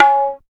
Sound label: prc c#1, Tabla + Sitar, MIRAGE; ProSamples vol.55 - Retro Sampler